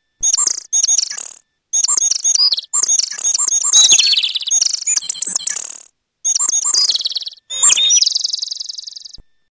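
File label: bird, skylark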